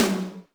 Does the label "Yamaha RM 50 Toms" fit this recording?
Yes